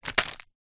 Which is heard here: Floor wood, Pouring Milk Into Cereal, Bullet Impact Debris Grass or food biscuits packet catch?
food biscuits packet catch